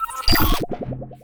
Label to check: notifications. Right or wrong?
Right